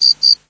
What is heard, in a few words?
cricket double; random amb sfx